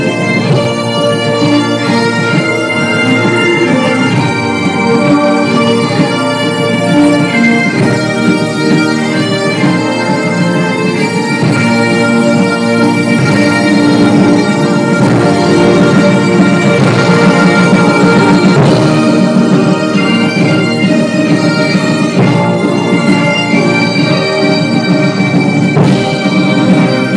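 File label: the pipes carlton hill edinburgh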